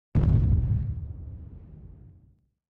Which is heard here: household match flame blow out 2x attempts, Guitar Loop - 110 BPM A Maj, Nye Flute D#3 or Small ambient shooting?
Small ambient shooting